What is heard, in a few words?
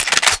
RELOAD, weapons; czero opforce; MSG90